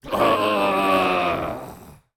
Death Vocals; Pharaoh

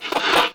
Raptor Attack Vocals

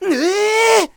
Samurai Attack Vocals

